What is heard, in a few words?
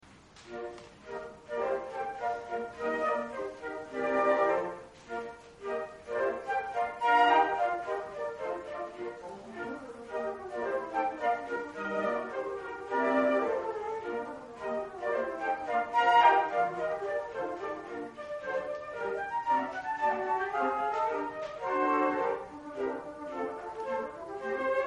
concert, flute